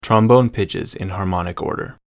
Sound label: trombone scale; jargon wind tunings